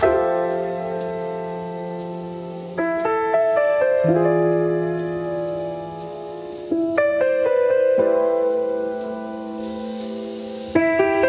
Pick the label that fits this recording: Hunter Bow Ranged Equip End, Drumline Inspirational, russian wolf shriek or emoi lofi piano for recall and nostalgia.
emoi lofi piano for recall and nostalgia